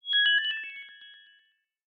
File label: htc willow